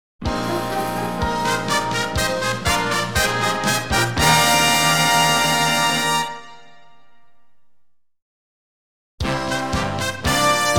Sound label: Glamour Fanfare